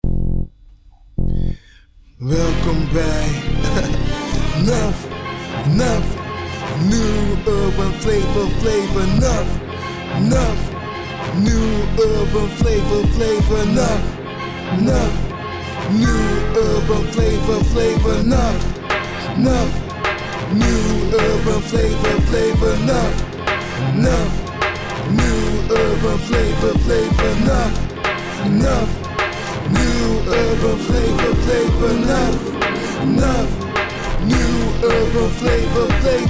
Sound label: jingle